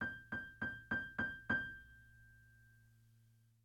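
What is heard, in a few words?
Theme F minor G; DeadFright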